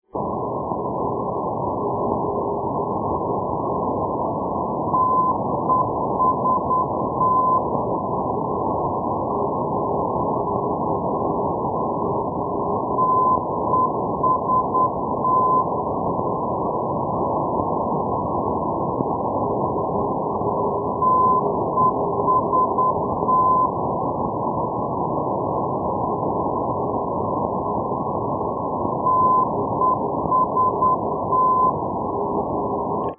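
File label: ndb, non-directional beacon; dortmund-wickede; kamen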